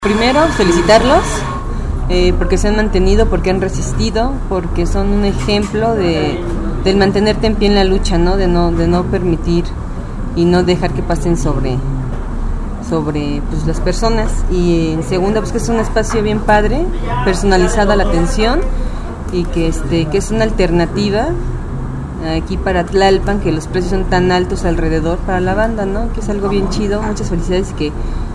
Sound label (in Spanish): comensales; cafe victoria